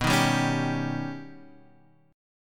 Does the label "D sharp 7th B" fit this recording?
Yes